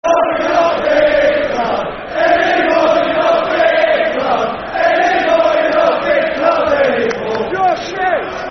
You're Not A Big Club Anymore Football Chant (Soccer Song); Brighton Bhafc